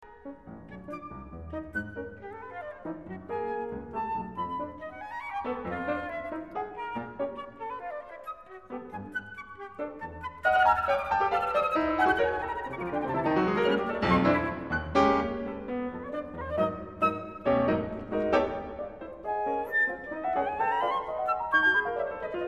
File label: Flute Music; Flute Music By Swiss Composers; Moeschinger - Presto